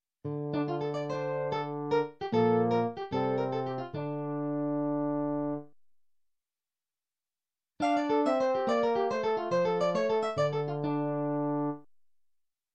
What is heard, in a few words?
Music, Medieval bridge; Sound Ideas 2000 Series